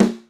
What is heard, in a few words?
fat snare sound a sharp key